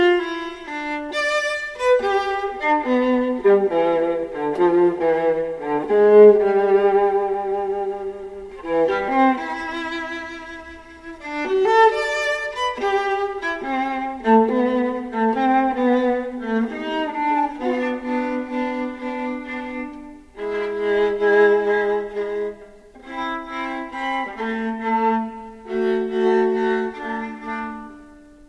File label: Brahms Quartet No3, Bratsche